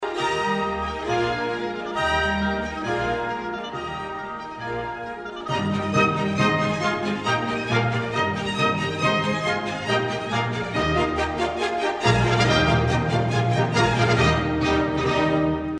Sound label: trumpet concerto e flat major; haydn